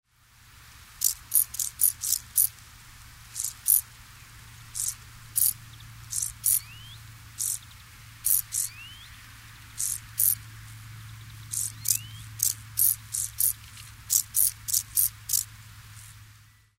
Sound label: field grasshopper